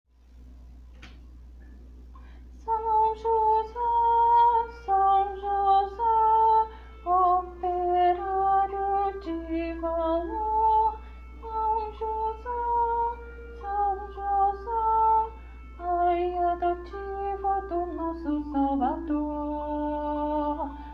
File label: contralto; hino a sao jose